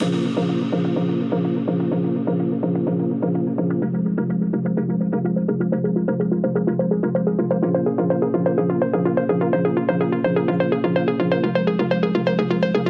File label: Garage Music